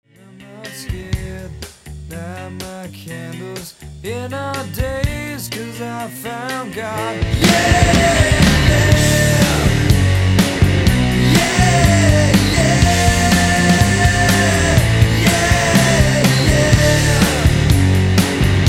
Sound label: grunge